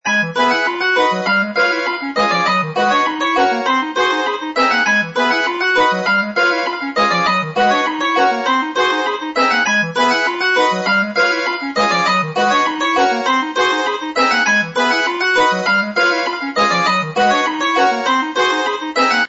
Jig; Sony Ericsson; ringtones; Summer 2006

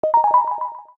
Ripple, notifications; ZTE Grand-S-2

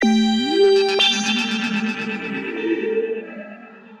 Noise, ringtones; Google; NewWave Labs

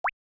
Water Drop Notification Night; Xiaomi Redmi-8A

